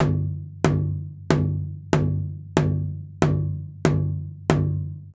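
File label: Big drum Small Beater; Nightfall (Tabbyewik)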